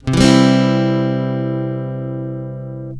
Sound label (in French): accord do majeur, l’accord de Do Majeur en vitesse normale; guitare